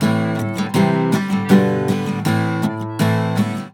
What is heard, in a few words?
AM GTR, Celtic Flavours